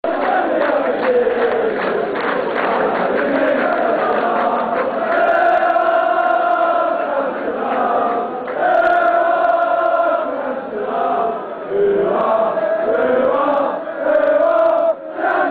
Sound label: Ooo Ahh Cantona; mufc